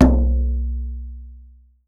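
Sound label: large brass, dunbek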